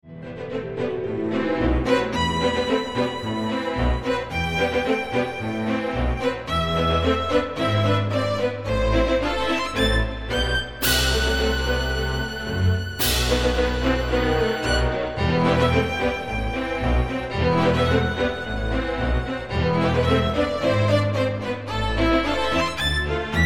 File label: tango; theatre